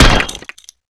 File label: hit wall, knife; cso; tknife